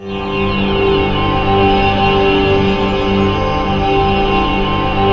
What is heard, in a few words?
PHANTOM; NorthStar - The Wizard VOL-2; IMPULSE